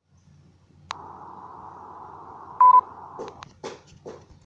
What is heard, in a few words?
left the game sound effect; among us